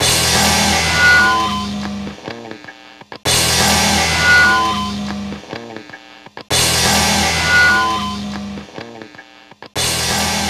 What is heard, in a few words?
bar music ends abruptly; the warriors 2005 ps2